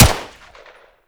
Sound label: Pistol Shot B; Warframe; Tenno; Tenno Harlequin Pistol